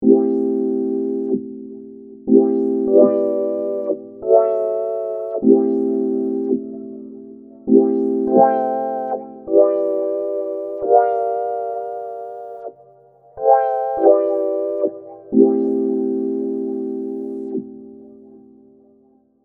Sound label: TAL-S EMU2 VCF